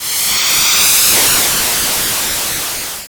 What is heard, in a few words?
Explosion